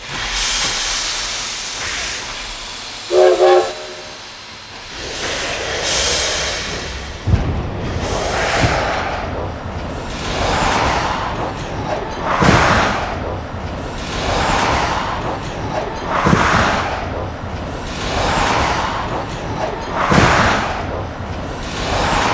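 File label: locomotive starting; loko rozjezd; Mafie 1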